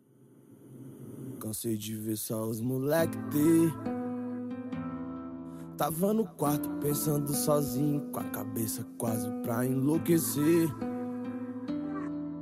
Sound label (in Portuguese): hip hop graves bass